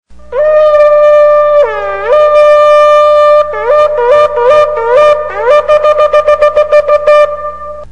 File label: shofar